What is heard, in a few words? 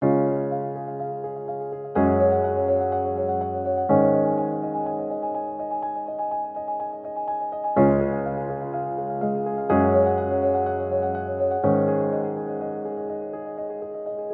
Happy Piano; Storytelling Essentials